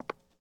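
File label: Foot Concrete Shoes Walk; Lucius III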